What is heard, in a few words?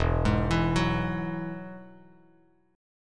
E Major Add 9th, E, Bass Standard; arpeggiated; JGuitar